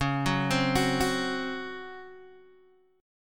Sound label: C sharp Major 7th Suspended 4th C sharp Guitar Open D